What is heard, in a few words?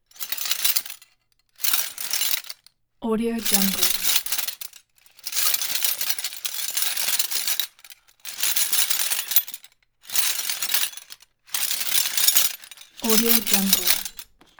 mechanism source metal swirls chunks and reloads; soundmorph